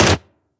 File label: Syringe Gun; Weapons